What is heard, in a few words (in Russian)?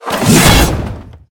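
obrushivshiisya na pol tyazhelyi molot vikinga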